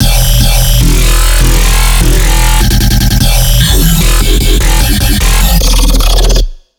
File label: E 150, Dubstep Bass Loops; Toasted